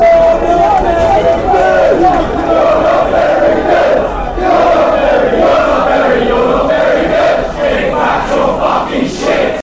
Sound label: your not very good fanchants; bfc